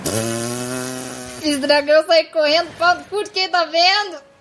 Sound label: drag